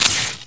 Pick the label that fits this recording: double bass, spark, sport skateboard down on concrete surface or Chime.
spark